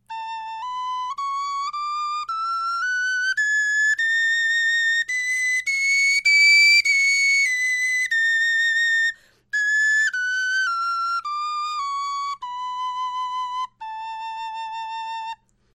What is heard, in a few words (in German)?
tonleiter, knochenfloeten